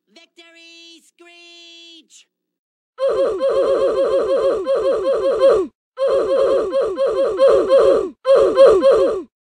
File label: victory screech but every screech is replaced with the death sound; roblox